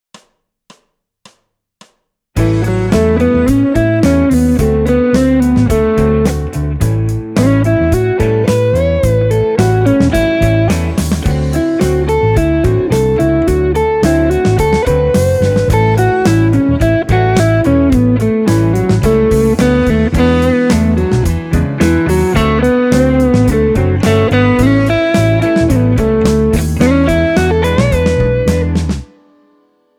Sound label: blues